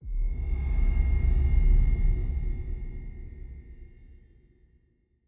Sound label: awaken impact; anna